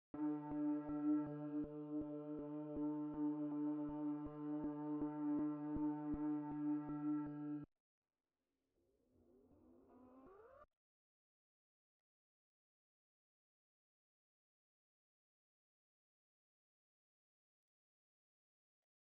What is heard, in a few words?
160 BPM G Min Drone Synth; RnB; Loop Stems; Cymatics; Aroma; 2022 Melody Collection; RnB Pt 1